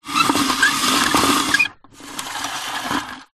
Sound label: household washing line retractable pull extend plastic housing